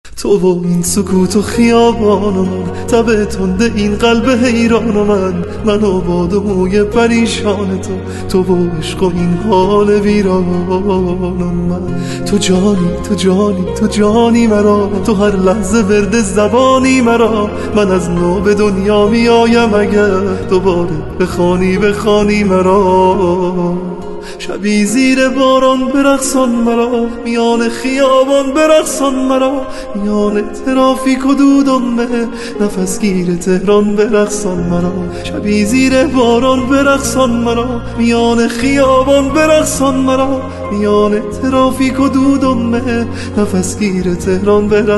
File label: Piano